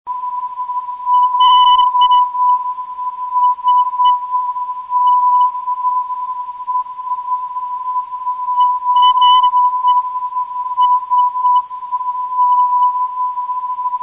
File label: 383khz, ndb; lst